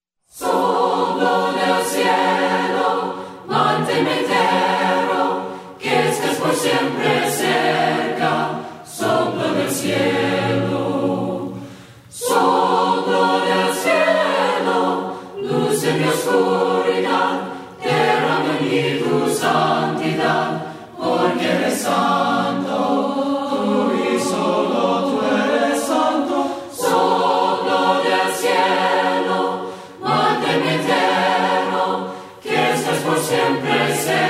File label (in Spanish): VOCES, himnos a capella; cantos del camino